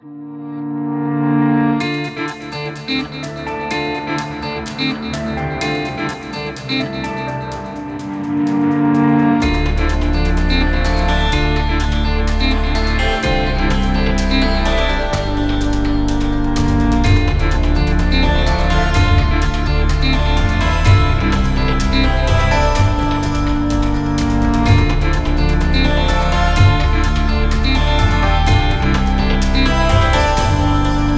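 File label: Scoring Guitars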